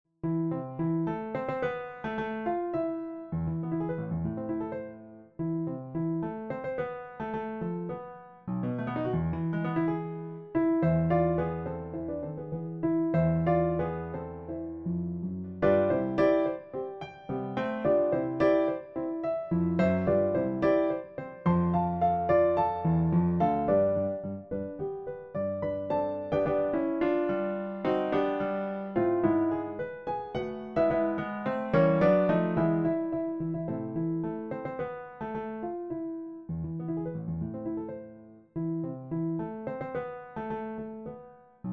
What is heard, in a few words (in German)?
Romanze; Schuhmanns schoene